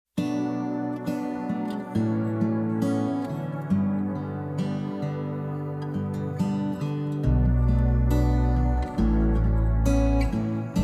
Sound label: Tenors